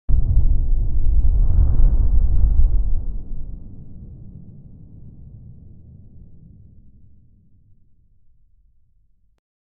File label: something massive emergin